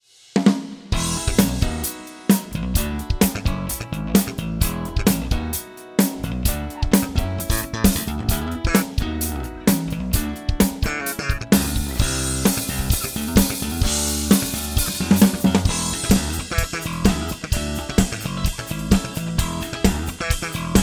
Korg A1 Drums Bright Plate

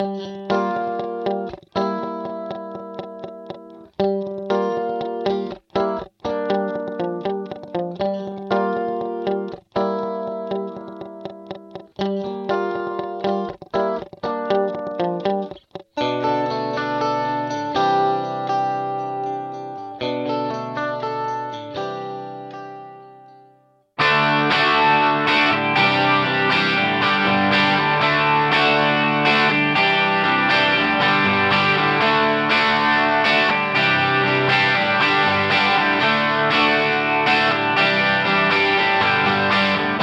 Guitar Yamaha Rev7 Chorus B Vintage Digital

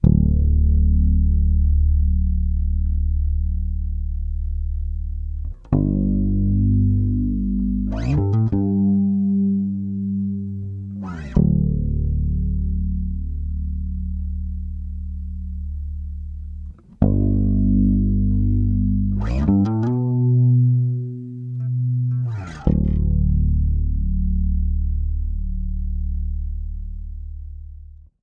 Bass Wet

